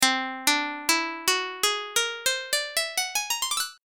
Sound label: tone